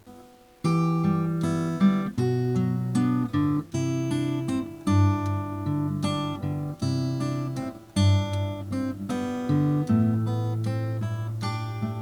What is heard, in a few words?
Wedding Song intro